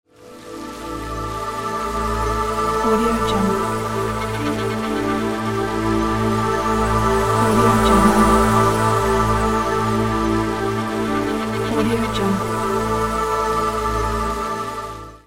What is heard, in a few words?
autumn wave ident music